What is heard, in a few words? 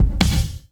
Kick Snare; Esoterik Beatz; Saver Kit